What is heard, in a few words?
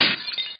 Breaking Glass; ICQ; Notifications